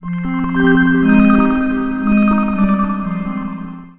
Brite Ties, Bells & Tines; Sonic Foundry; The Electronic Music Manuscript Collection

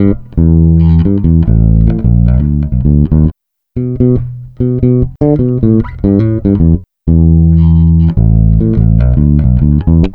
Bass, Electro Lounge; Sonic Foundry (Sony Creative Software); 072 Green Street

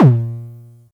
High Kicks A#2; LIMAT Div Dept; Drums

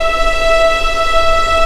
ANG.V E4, ANGELIC

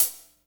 HH hh; Best Service Gigapack 2

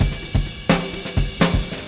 Fusion 128, Beat Loops; Zero-G; Monster Beats

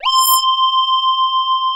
SQR LEAD C5, PPG, analog; USB Soundscan vol.49; Analog Fantasies; AKAI

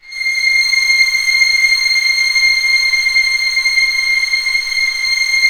STR C6 FF, Orchestra; Sound On Sound - Peter Siedleczek's Orchestra

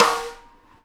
Live Snares; E-MU Producer Series Vol. 8 – Platinum Phatt

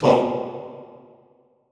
TONG B1, Extended Classical Choir; Best Service; SHORT TONG

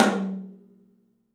OPEN TOM; 3D Sound; 3D Drums

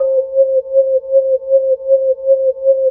Vibratone Slow; Roland BK-5; Percussion

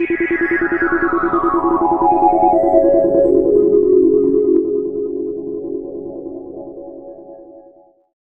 Pads; Mental Business; Jungle

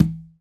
LOW TUMBA; Conga Battery; E-MU Formula 4000 Series Vol. 4; Earth Tones

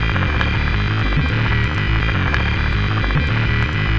Machinery Melodic; Sonic Foundry (Sony Creative Software); Myths of Technology

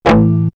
Mute Guitar; X-Static Goldmine; backtothe70's

